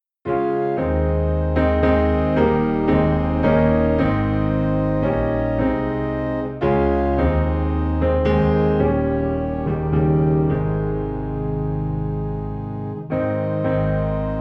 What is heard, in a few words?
Piano and Organ Low in A Flat; He Hideth My Soul